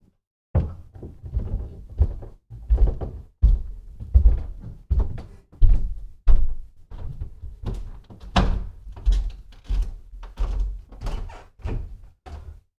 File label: Under Wooden Stairs Stomp